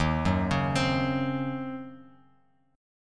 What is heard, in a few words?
D sharp Minor 13th D sharp Bass Standard, chord; arpeggiated; JGuitar